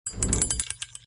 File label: Alerted; Lethal Company